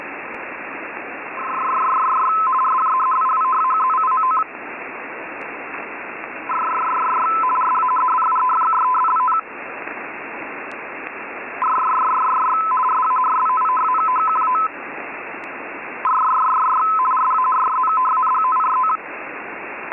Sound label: GPS buoy FSK 51 Bd bursts on 28100 kHz